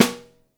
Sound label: SN1 Overhead, Snare; Ableton Foundation; Snare Designer